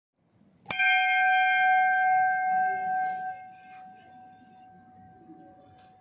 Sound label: bell